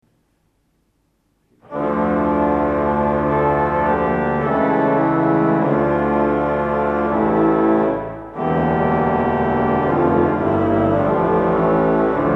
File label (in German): Streich 3, Orgelstimmen